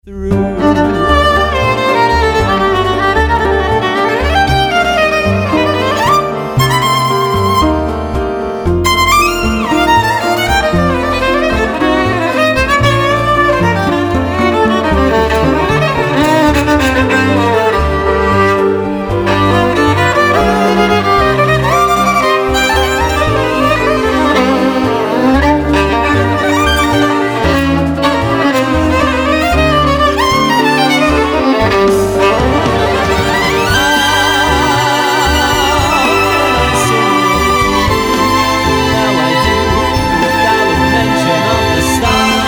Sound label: Solo w Strings